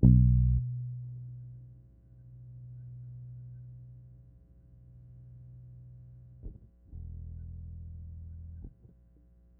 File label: bass takes